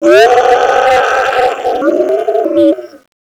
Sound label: death